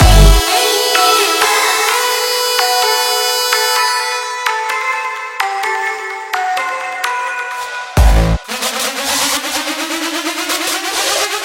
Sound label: Dark Trap Vibes love fill